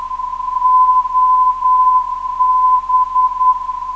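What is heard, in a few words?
330kHz, non-directional beacon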